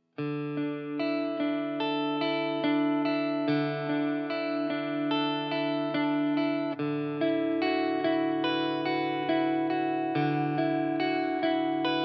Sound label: chords